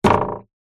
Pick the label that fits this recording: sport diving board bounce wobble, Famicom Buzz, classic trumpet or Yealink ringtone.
sport diving board bounce wobble